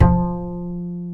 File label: Acoustic Bass